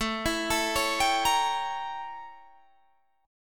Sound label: A 7th Flat 9th Guitar Standard; A7b9 arpeggiated; JGuitar